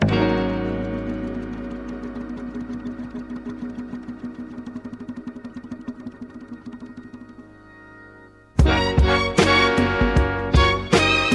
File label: Warm embrace Bbm 157; masada soul crates vol 10